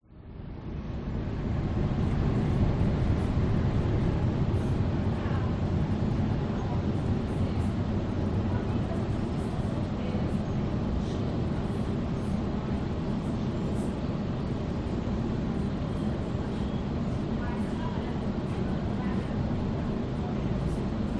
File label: train metro interior between stations doors few people driver annoucemen; vehicles